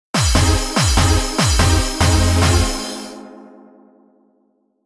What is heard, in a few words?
Dialogue; Hoshi no Kakera; Acceleration of SUGURI